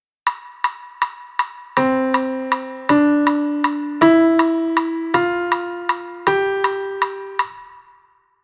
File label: dotted quarter